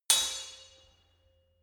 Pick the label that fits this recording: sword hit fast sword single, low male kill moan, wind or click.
sword hit fast sword single